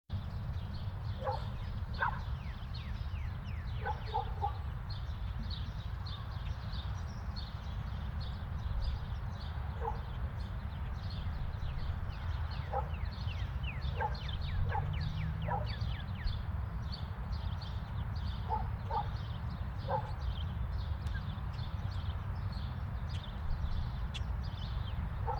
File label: Suburban Neighborhood